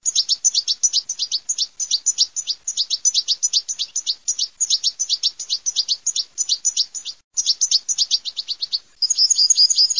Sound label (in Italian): cardellino